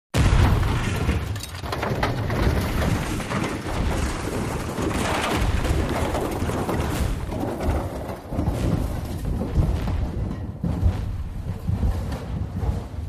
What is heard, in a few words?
Crash Car Rolls Down H